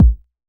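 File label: Bd, bass drum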